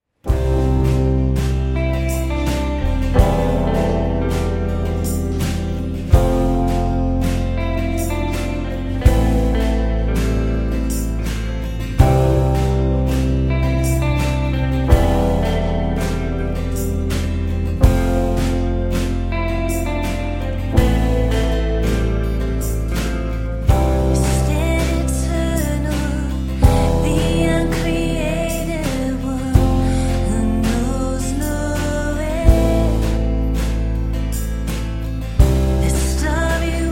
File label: acoustic version